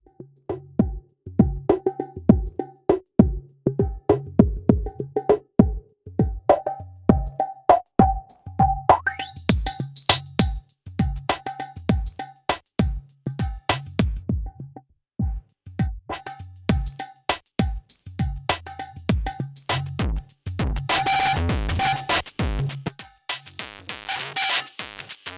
Filtered Drumloop

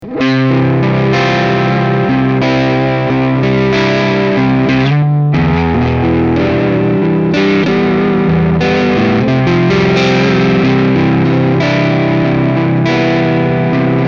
TT Overdrive

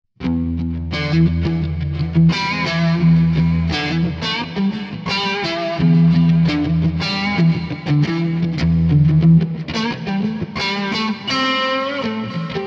Mesa Boogie Crystal Clean; Neural DSP

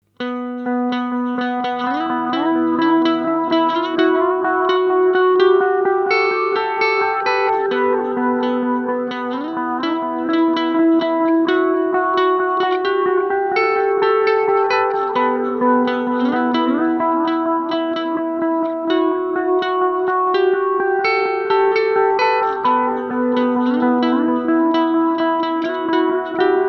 Boss DM 101 Dual Mod

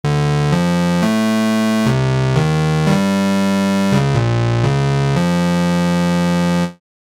Test Saw Square

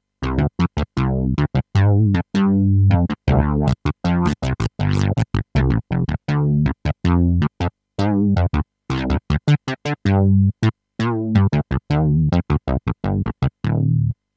Synth Bass

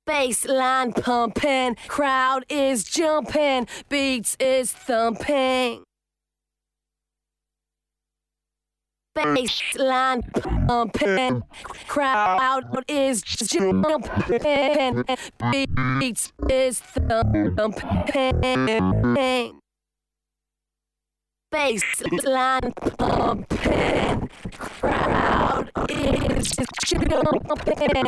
LFO Granular

